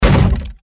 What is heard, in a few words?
short crash impact